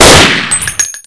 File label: gun